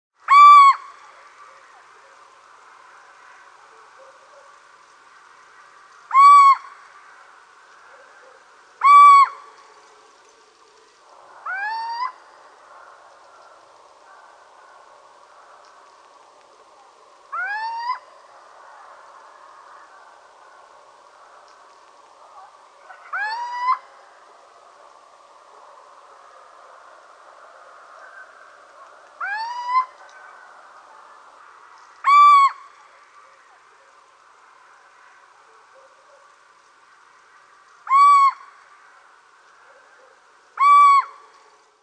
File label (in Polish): pojdzka, birdsounds